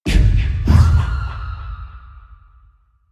Kikikimamama Scream

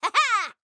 Jump

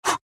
Punch

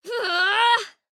Attack Charge; Arya

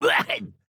AHurt

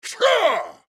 Attack Large; Black Adam

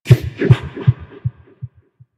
Heartbeat

